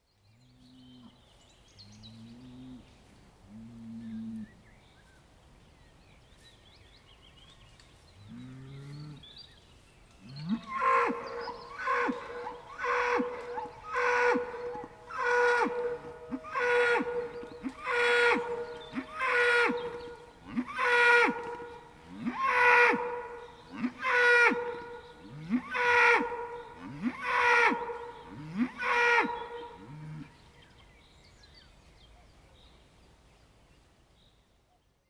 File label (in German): schreiender Bulle, sehr nahe